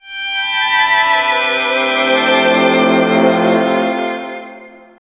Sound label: Rich Strings